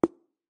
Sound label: pop; CrossyRoad